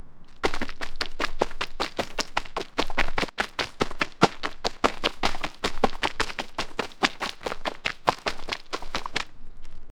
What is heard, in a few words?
someone runnng fast throu, gloopy